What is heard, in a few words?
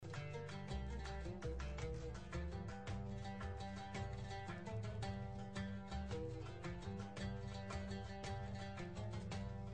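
arab saudi background music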